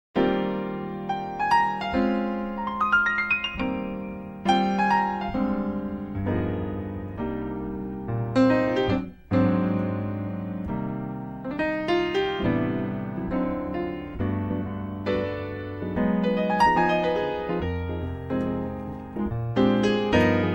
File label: String Quartet; Gabriella Strings